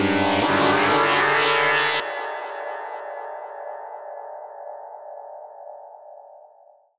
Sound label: Synth Sting; cinematic drama; Stings; musicradar